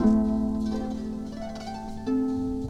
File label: guitar